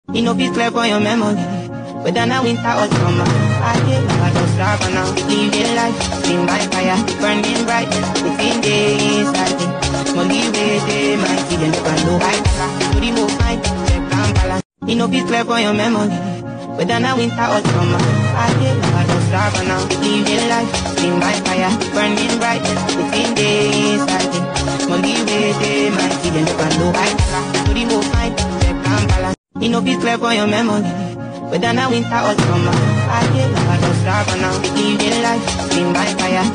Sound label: Amapiano Version